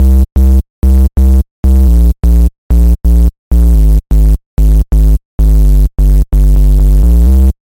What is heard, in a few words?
Bass - Noisy Sub; Routed Sound; Serum 2 Expansion - Hyper Gamer Vol.1